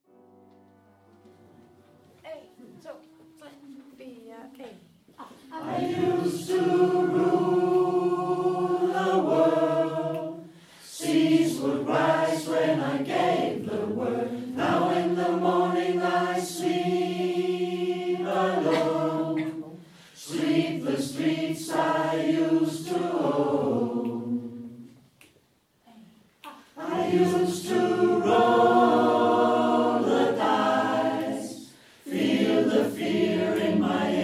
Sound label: acapella